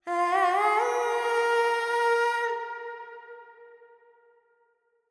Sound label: Verb Dorian, Vocals, Carnatic Female, Alaps, 70 G